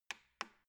embedded button press

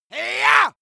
male shout fight

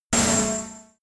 sci-fi laser shot

